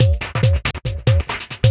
Break Beat, Drum N Bass

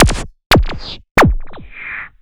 CARTOON, Hard & Loud Techno

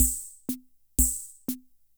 Multivox Rhythm Ace Loop, drum machine; 300 Drum Machines; Multivox Rhythm Ace Sample Pack

